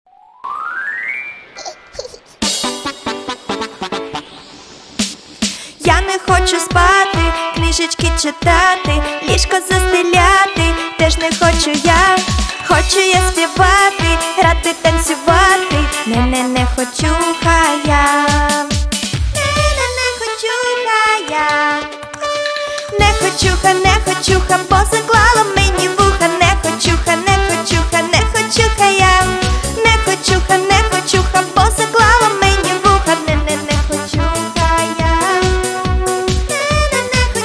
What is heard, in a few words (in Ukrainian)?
Дитяча пісня